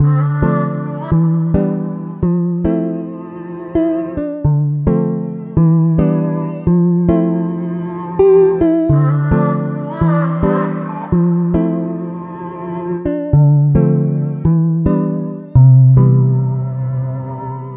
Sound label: songstarter 108 C, Pop; Slate; SD PASADENA Pop Sample Pack